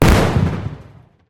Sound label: explosion; FMODStudio